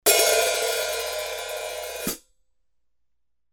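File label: HH ZHT 14 HPR 14, hi-hat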